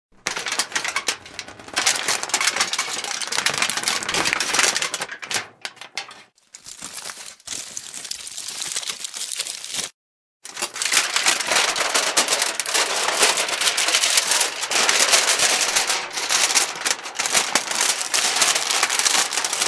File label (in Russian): raspakovka folgirovannoj bumagi